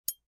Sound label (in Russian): zvuki viazaniia